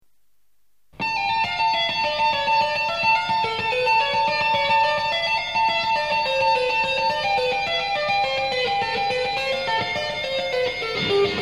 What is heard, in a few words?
tapping; bach